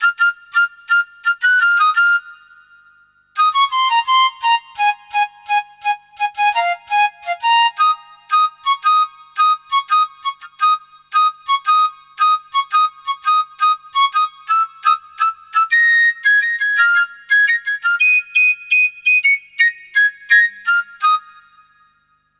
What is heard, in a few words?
Flute